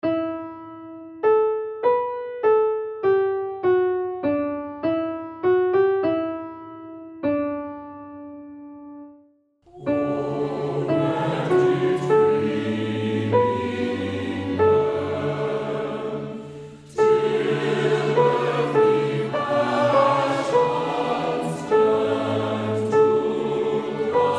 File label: Come Down Soprano